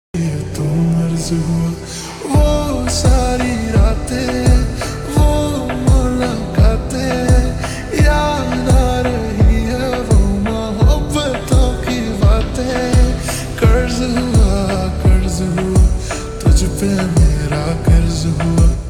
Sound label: Slow Reverb Version Ringtone